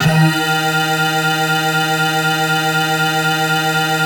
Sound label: synth clav